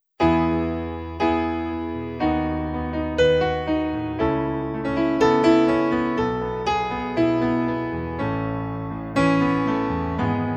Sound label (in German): E Dur 60 Bpm